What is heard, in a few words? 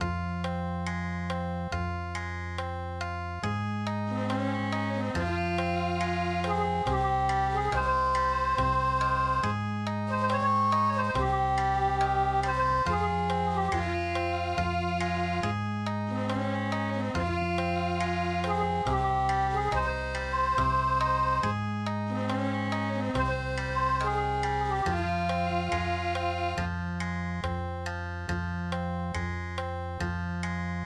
Okinawa